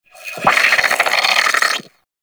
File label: Sentient Attack, Barks; Warframe; Sentients; Brachiolyst